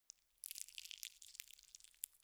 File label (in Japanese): 挿入音; 効果音